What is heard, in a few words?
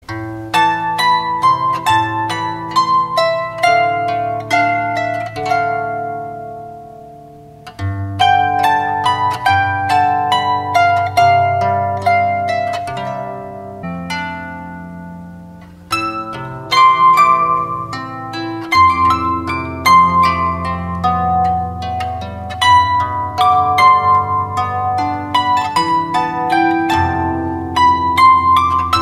mobile ringtone